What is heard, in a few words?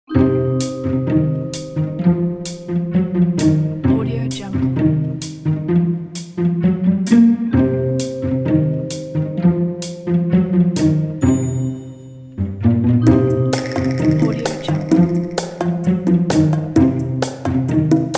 sneaky and mischievous